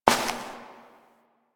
Step Perforated Metal; Warframe; Koumei

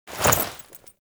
Move Foley Short; Characters; Warframe; The New War; Veso

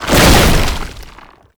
tank infested melee swing; Enemies; Abilities; Lasrian Tank; Warframe

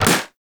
Primary Shot, Weapons; Warframe; Sapient

